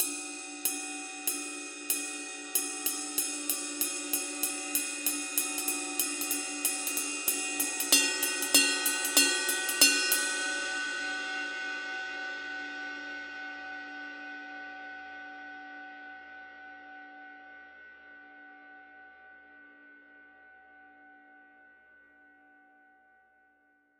signature 22 reflector bell ride pattern

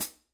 formula 602 14 hi-hat chick; modern essentials

